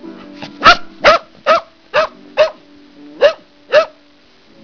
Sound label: bark; Lucky